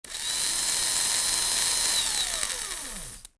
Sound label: surveillance camera whirr; Max Payne; subway